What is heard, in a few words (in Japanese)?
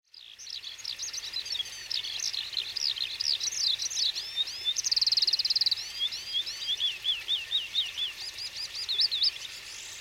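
hibari, skylark; mie; koe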